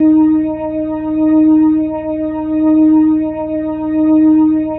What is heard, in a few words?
Single Note D#3, Organ; Loops; Single Notes; Sonic Foundry (Sony Creative Software); Joe Vitale Organ Donor